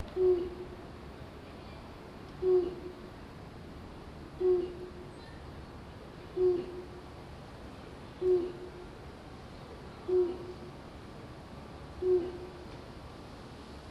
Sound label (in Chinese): 黑冠麻鷺